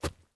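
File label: grass, steps; Terrarum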